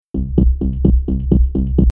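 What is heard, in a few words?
140 explosion bass louder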